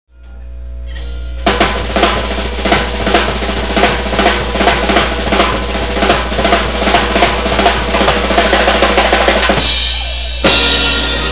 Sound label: drum boogie